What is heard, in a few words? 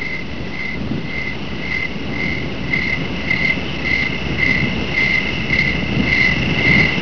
crickets